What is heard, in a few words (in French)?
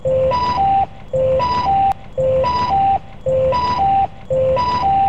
alerte; alerte generale